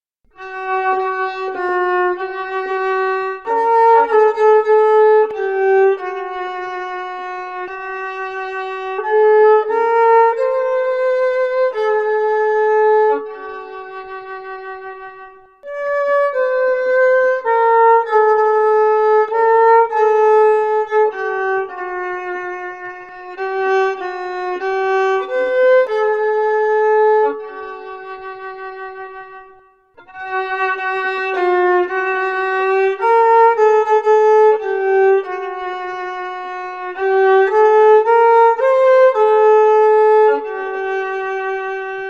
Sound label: Violin, Coventry Carol; Parker Violin